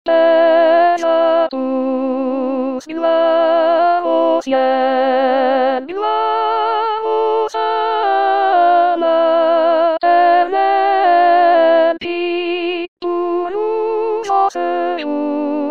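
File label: soprano 2; Douce nuit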